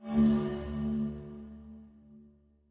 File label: boss alert